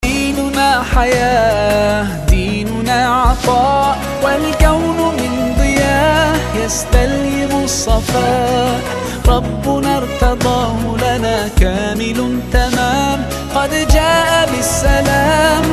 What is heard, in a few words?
(Arabic Version - Bonus Track)